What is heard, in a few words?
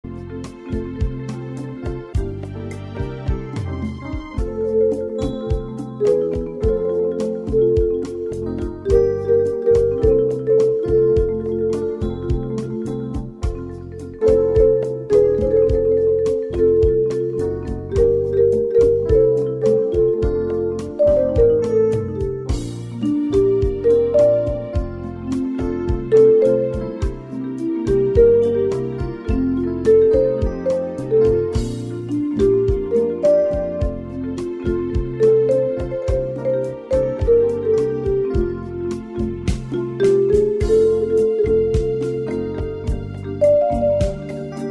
Marimba